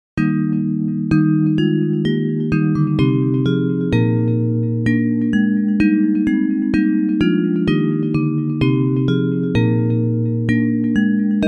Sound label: Bells; Ye Watchers and Ye Holy Ones; Praise